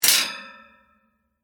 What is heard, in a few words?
Vent Cover Down, Industry, Vent, Cover, Set, Down, Metal; Machinery; BlastWave